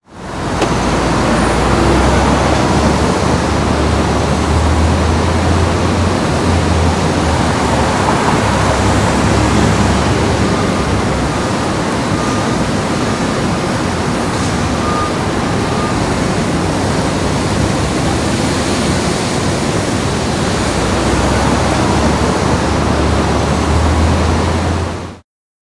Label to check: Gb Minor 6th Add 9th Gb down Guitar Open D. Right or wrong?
Wrong